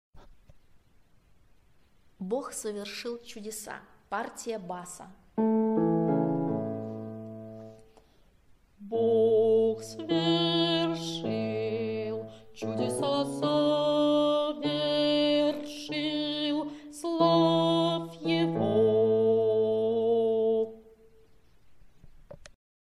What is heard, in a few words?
bas; Bog sovershil chudesa